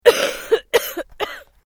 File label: FEMALE COUGH STRONG; Female vocal sounds; Clearing Throat (Sound Effects:Human)